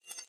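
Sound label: Maze Retro-Game Chain-Pull Reverse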